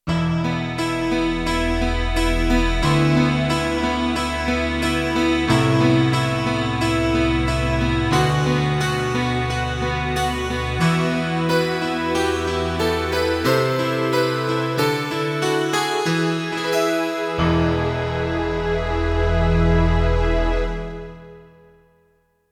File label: Guitar MIDI, Roland D-70; Factory Performances